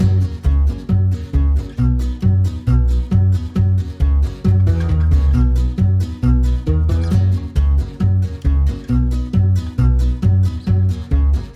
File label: ambience Music Layer; netent